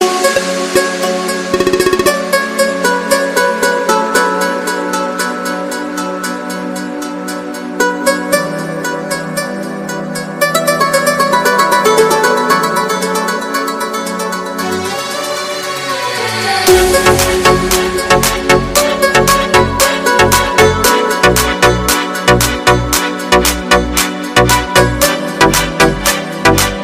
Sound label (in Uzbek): best ringtone telefon uchun qung iroq